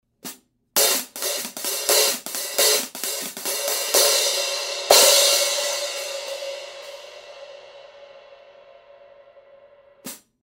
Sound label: Kerope, cymbal sound